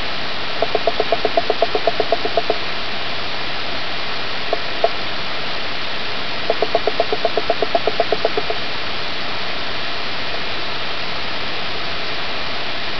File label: wireless phone receiver